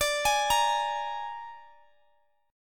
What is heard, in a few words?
Ab Suspended 2nd Flat 5th D